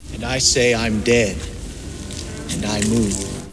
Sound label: crowe